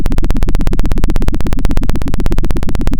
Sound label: pop, Sound of a helicopter